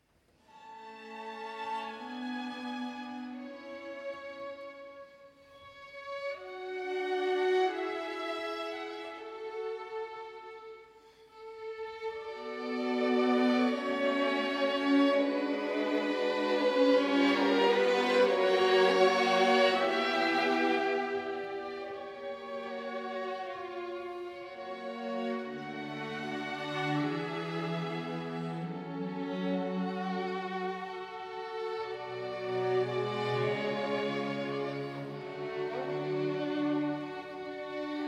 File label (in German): serenade e dur larghetto; dvorak